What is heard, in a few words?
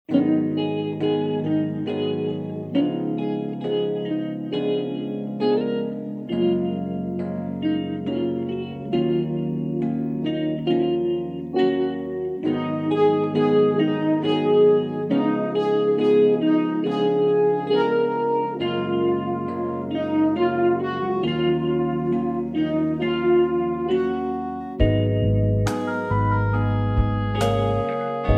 Gb No Backing Vocals, backing tracks